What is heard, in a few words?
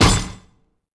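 impact sword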